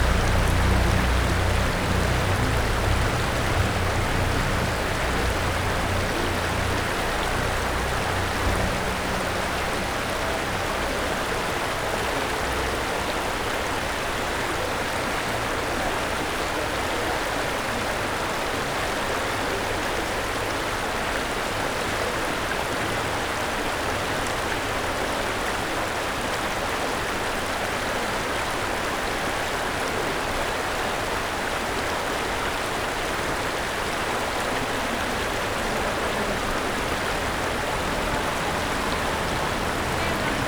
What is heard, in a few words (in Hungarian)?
Todra szurdok hömpölygő víz